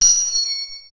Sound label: a sharp metallic clang